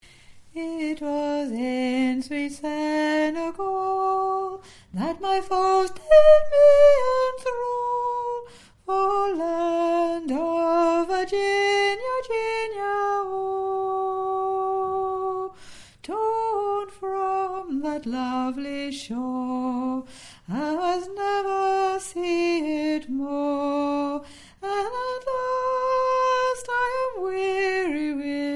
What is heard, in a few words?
Slaves Lament Sop